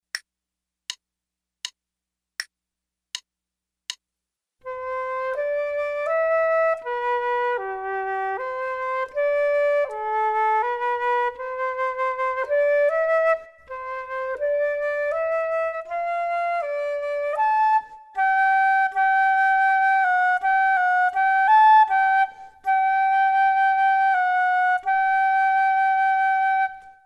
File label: Duets flute; Selected Duets for Flute Podcast